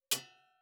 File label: Metal Hit; Fantasy Sounds Bundle; Medieval Combat Sounds